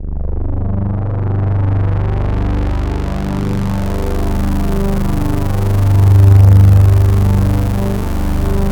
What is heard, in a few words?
analog swell; Sound Gallery - Charged